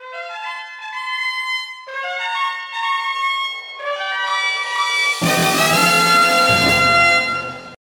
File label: Asus Tone